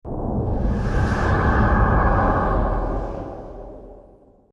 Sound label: Cloak; Star Trek